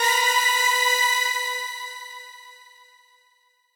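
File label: synth strings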